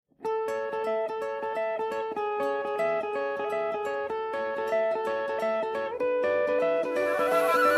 Nice Guitar

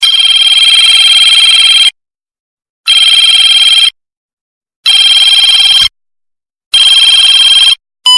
Telefon

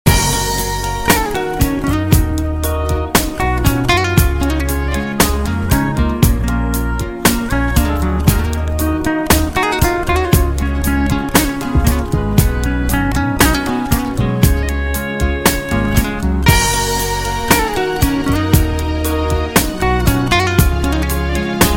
Romanian Guitar

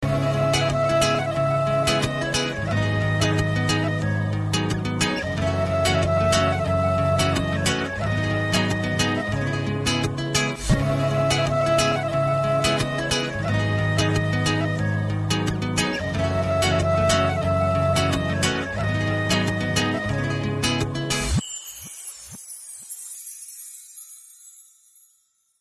Cristal Guitar Mix

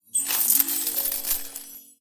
Coins

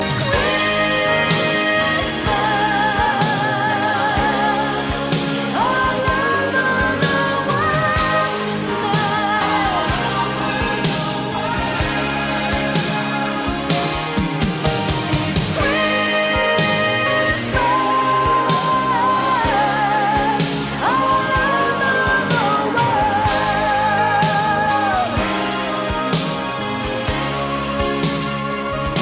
Christmas Song

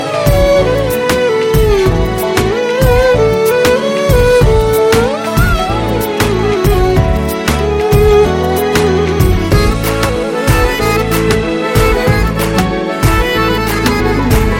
Dreamy Bollywood

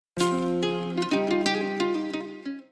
Gitar

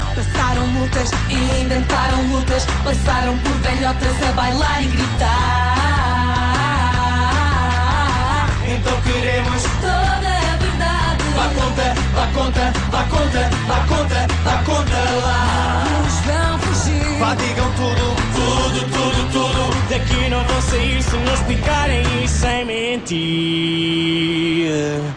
Hey Ah

